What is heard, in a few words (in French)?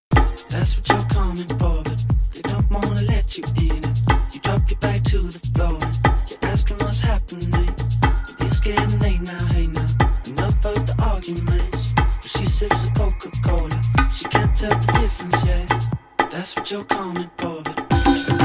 Live percu